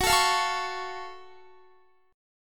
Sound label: Gb Minor Major 7th Gb down Guitar; strummed; JGuitar